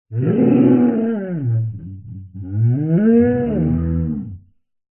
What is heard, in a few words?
dinosaur large growl distant dull